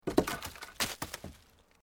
Wood Logs Movement Major; Material components for constructing more complex sounds; Materials

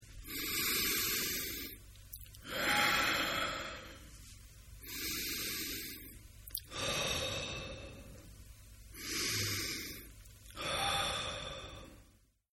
Breathe Deep Male; Breathing; Human

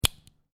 Lego Connect Two Lego Pieces; Miscellaneous Toy Sound Effects; Miscellaneous Toy (Sound Effects:Toys)

